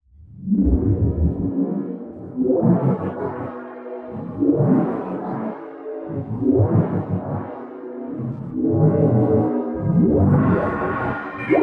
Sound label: chords2